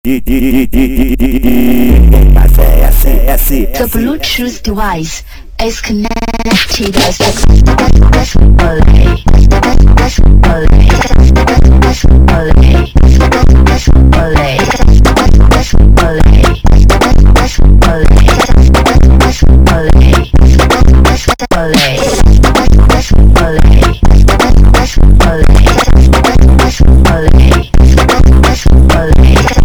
Funk

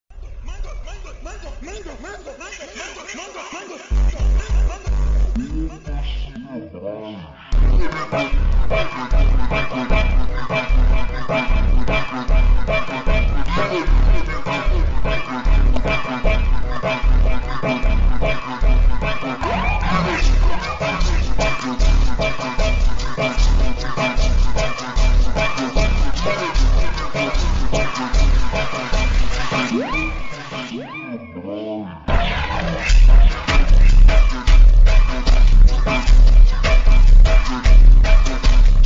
deep flanger echo

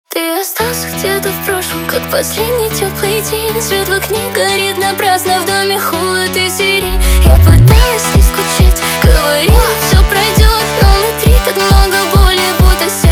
Russian Funk

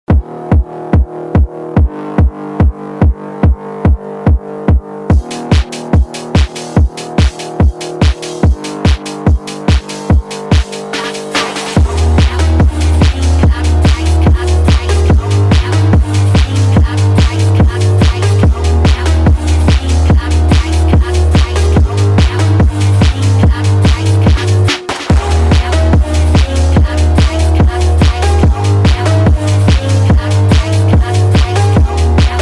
Phonk Sped Up

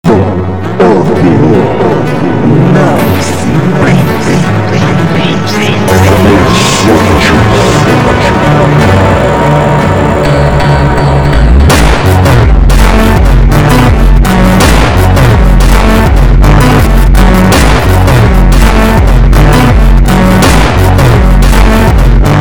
Montagem, slowed, reverb

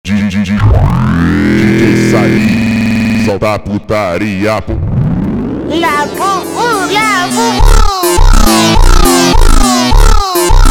Funk slowed

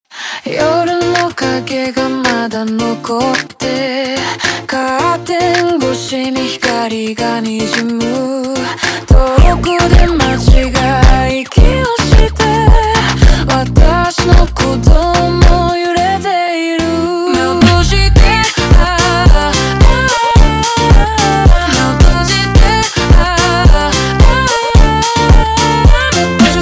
Montagem ultra Slowed

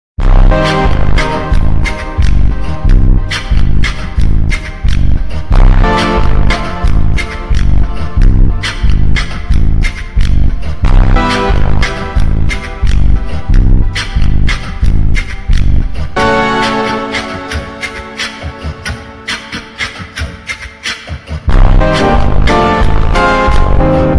Automotivo Funk slowed reverb

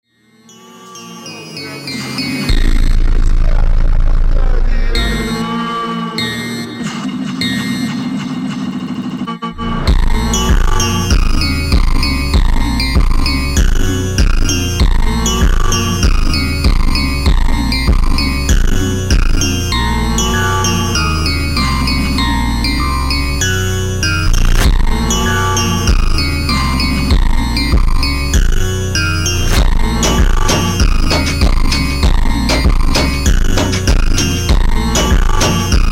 Funk super Slowed

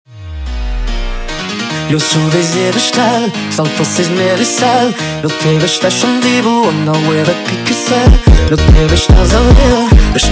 Batida sped Up

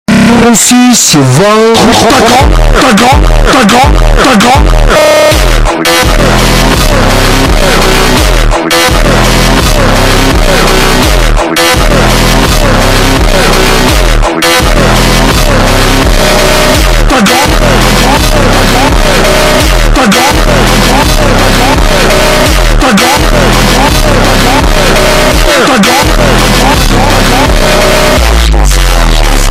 Funk slowed

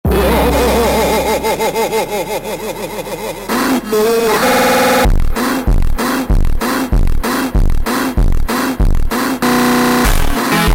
Funk Rush Laugh Super Slowed

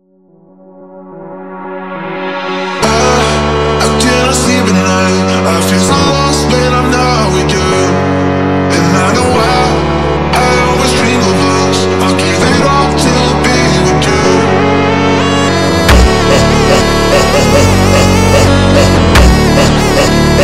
Phonk

